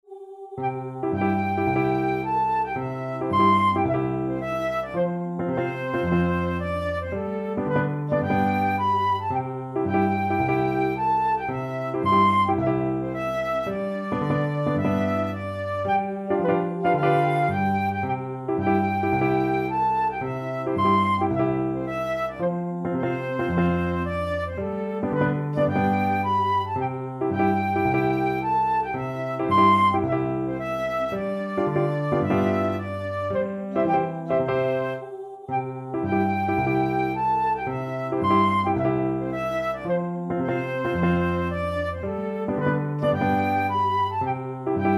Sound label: flute piano voice